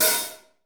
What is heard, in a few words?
HH 1O2 R, DRUMKIT